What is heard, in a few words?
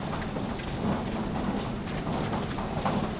Mines Conveyor Loop